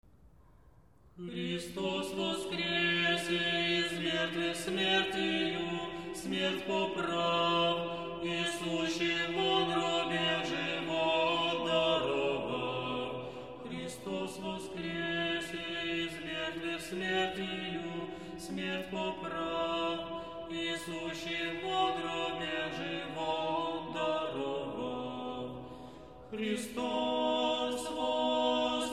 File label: Christ is risen, Russian Spiritual Music